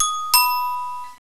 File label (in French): Sonnette Haute